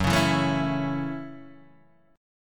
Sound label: F sharp Minor 7th F sharp, Guitar DADGAD